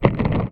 Percussive Hit, contact mic

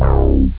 Kool Bass; jazz jackrabbit 1; M8

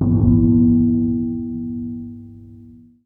Klang bass; Sonic Foundry (Sony Creative Software); Ugly Remnants VOL-2; Basstuff

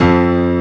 AMB PIANO

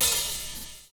ATMOSPHER; Univers Sons

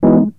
Rhodes, Keyboards; Drum & Bass; Total Drum&Bass

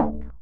phreaky snare; Trance; Drums; Snares; Trance Explosion Vol1

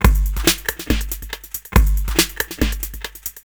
Break Pattern, Drums

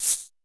Odd Shake, Alesis D4 Drum Machines

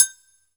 Triangle Closed B; Zero-G Jungle Warfare 1, 2 & 3; 90 s Sample CDs

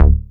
BASS D#1; 2 Step; USB Soundscan vol.51; House Side Of 2 Step; AKAI

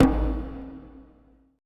TOTEM, ETH DRUMS

